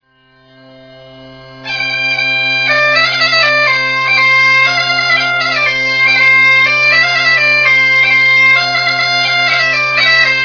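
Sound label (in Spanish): gaita gallega; tradiberia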